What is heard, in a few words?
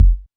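technox bass drum; Quasimidi Technox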